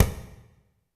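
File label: ambience industrial, ethnic percussion; Drums In Your FACE!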